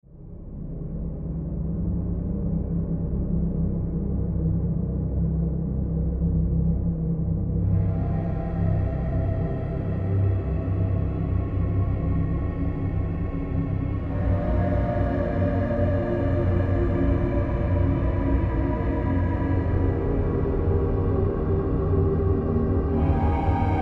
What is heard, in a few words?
Morphestra Single